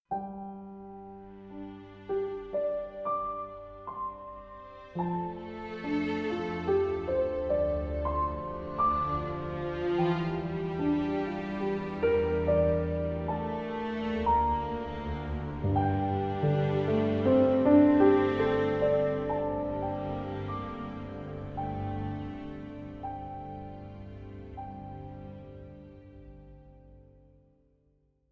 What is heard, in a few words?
Piano string; colonne sonore